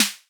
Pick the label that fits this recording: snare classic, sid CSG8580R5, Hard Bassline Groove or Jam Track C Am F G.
snare classic